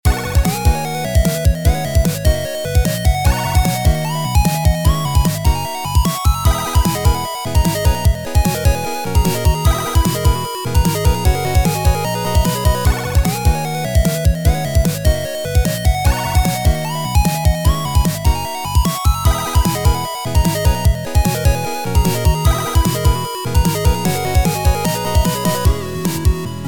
Gameplay music loop; Devil's Cauldron; android game music

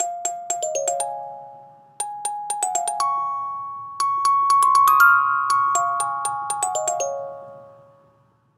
Music Box; Assassin's Creed Syndicate

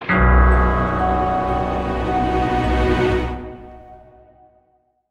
(Jingle) Mid Boss; balan wonderworld

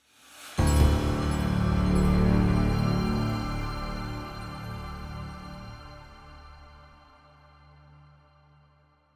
Combat Room Outro Stinger; wellspring

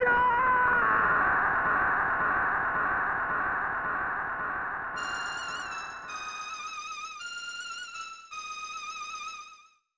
Deathcry

